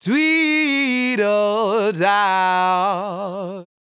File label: Ad Lib Dry, 160 BPM C# Min, Vocal; Cymatics; Euphoria Vocal Sample Pack; Ad Libs